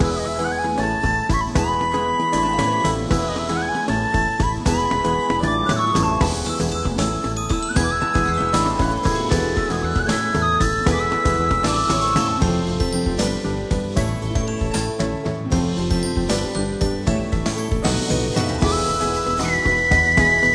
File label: Shop Loop